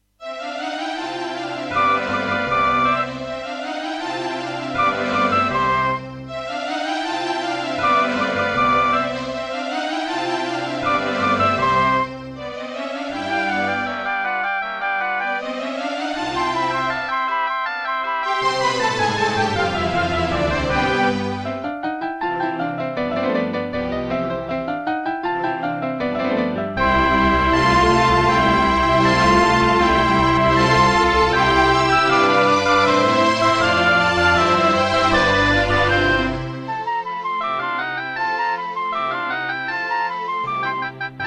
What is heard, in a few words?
Concerto partial, Serdaco McQub; classical; Mozart; Concerto K467 partial